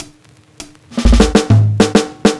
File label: Drum Fill 100 BPM; Drum Loops; Bonham Drum Fill; Cymatics; Cymatics - King Drum Loops (Teaser)